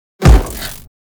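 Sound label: kick sms; pinok-sms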